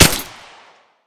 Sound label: grach silencer, weapon; metrocraft